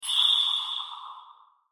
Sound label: Metallic Whoosh Soft Ghostly Reverb; Production Element Imaging Element Accent Transition; Metallic Sound; Blastwave